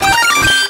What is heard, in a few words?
glitch